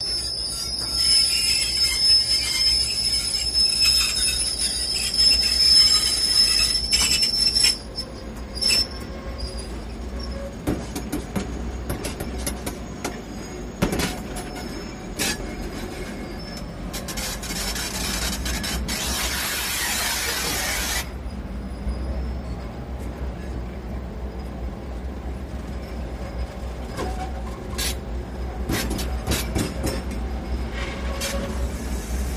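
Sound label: Diesel Locomotive Brakes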